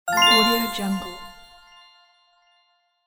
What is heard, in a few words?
star dust particles